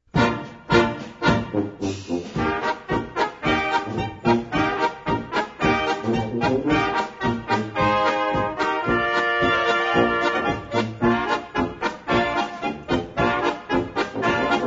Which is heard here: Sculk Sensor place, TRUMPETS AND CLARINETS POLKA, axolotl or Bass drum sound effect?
TRUMPETS AND CLARINETS POLKA